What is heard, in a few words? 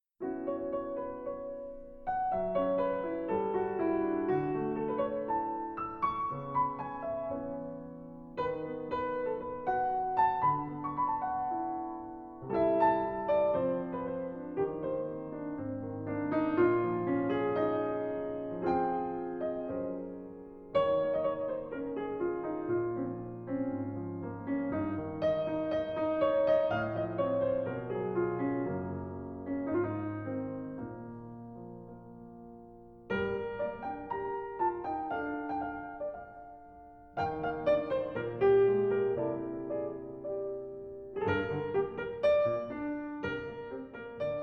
piano